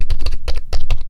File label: keyboard; Machines